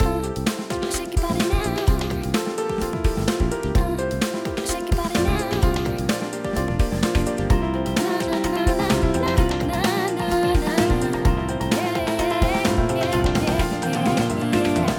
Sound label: compressor smack attack off short